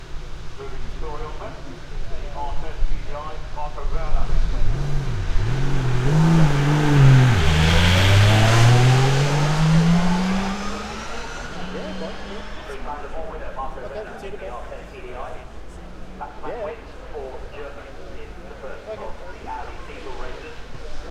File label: Audi R10 TDI